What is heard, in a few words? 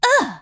"uh"; pauline; SM64 CO-OP